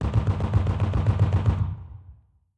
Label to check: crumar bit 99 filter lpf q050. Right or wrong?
Wrong